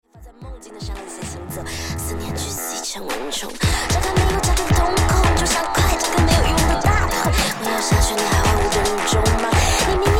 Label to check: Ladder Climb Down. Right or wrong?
Wrong